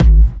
Bassdrums Dirty, Kicks; Vengeance Samples

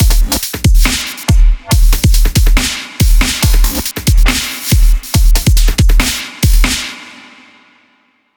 drum loop, Dubstep; Dubstep Sample Pack

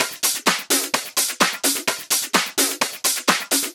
House Loop, 128 BPM; Vengeance Samples

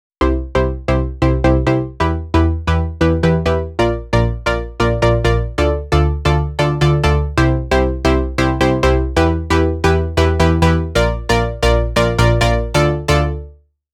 134BPM Pluck Intro DRY, Cmajor; Unlooped

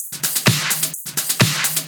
House loops 128BPM; Vengeance Samples

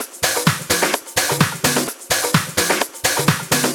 Minimal Loops

